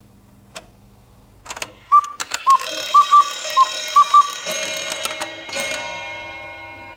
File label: cuckoo clock striking five times